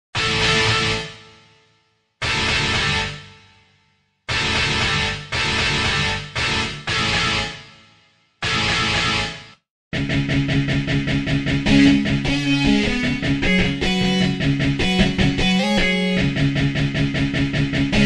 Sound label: Dist. Overd. Guitars